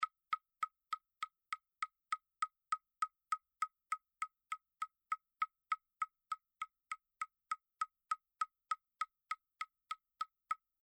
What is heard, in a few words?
Wood, binaural